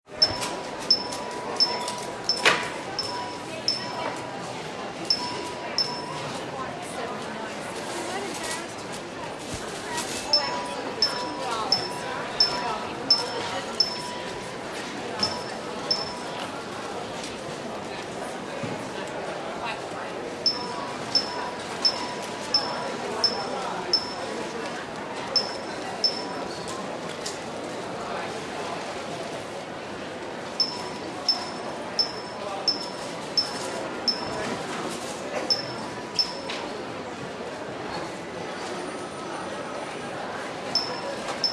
CROWD -STORES AND HALLS DEPT STORE: paging bell with background noise.; Macy`s